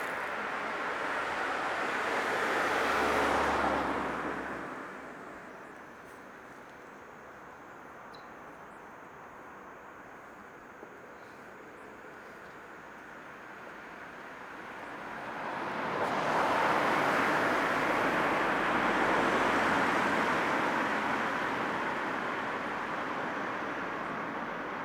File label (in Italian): suoni ponte via alport; borgo sacco